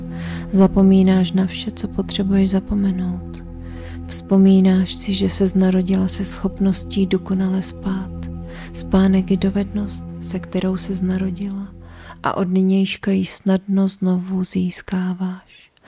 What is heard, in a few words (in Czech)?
Spanek relax hudba